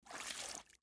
jump in water

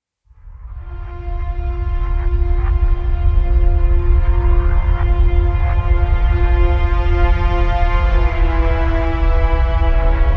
Fight Theme Ambient; Crysis 2

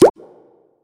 Bubble Pop

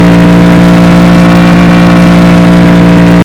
challenger off high

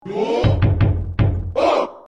Monster Level Up; Shiren the Wanderer SNES

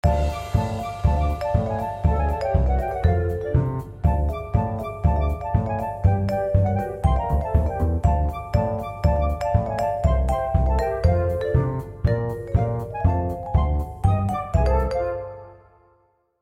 Music Cantina stinger long

